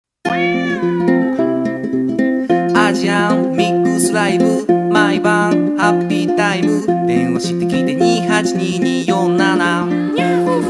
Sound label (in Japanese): ポップ